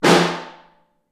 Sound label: SN2 Far, snare; Ableton Foundation FreePacks; Snare Designer